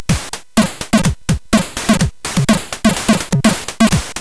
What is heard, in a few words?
Drums